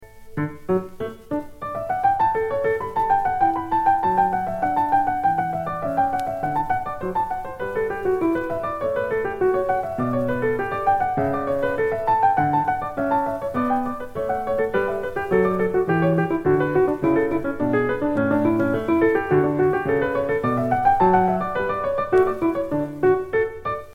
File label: vinile piano rig